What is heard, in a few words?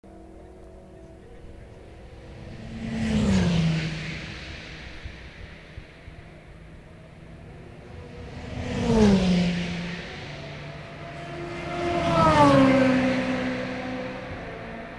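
Audi Peugeot 2x Aston Martin Corvette after Mulsanne Corner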